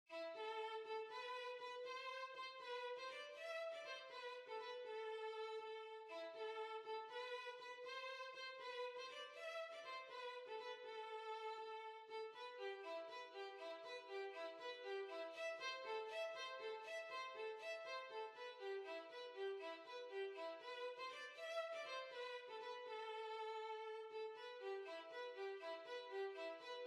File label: Violin